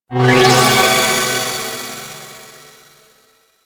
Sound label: Dojo Teleporter